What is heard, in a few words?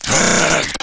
zombie attack; custom monsters; glitchzombie